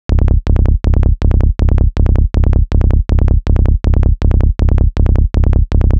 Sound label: psy bass; looperman